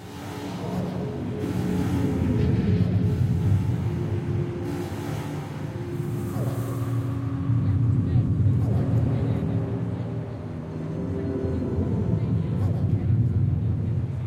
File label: city Future; Unciv